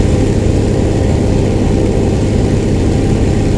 stuka cockpit, engines; War Thunder

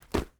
ui drop leather; Rust

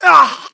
pain

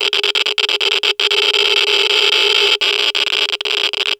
item geiger counter lvl4

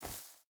footstep burlap grass run; Rust

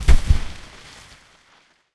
objects, World of Tanks

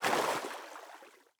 footstep water hard; Rust

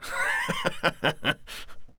taunt hehe

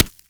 generic soft; Escape from Tarkov

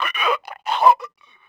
grunt die

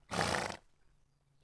Horse Vox; Ambient; Civ4

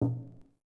Toggle On; Civ4 warlords

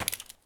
multitool drop; Escape from Tarkov

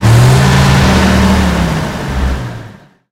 tiger diesel engine load, engines tanks; War Thunder

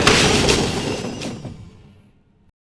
Mod Armour End Forest; Civ4 warlords

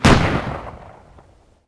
Rifle Fire; Civ4 warlords

